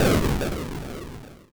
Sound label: explosion; Adventures in Lestoria; action RPG game; Sig Productions